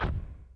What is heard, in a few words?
move; Fly For Insanity